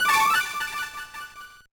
Mini Game High Score; Wario Land IV

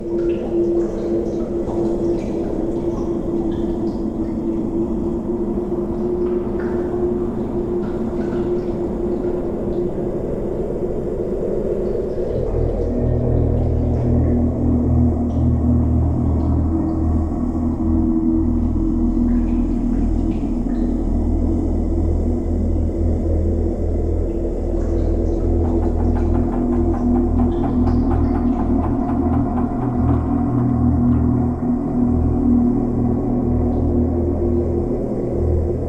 Prison ambience; Legend of Grimrock 2012

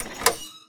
button click squeaky; Poppy Playtime

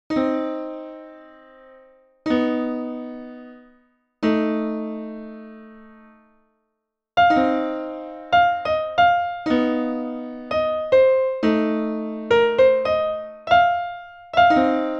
Splendid Piano; SimCity 2000

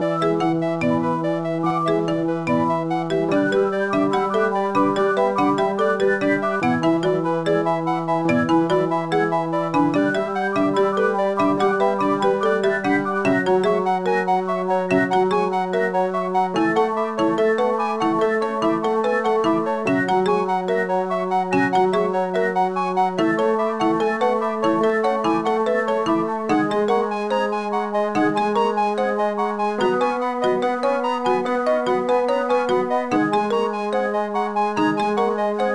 Music Arctic; Sesame Street; Elmo's A-to-Zoo Adventure; NDS

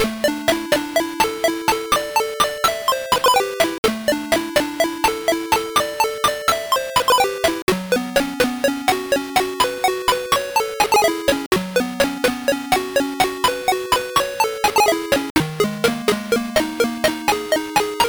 Map Jingle

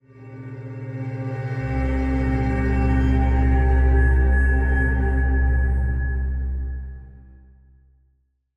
Stinger; Angry Birds Star Wars 2; Crimson Stinger Dark

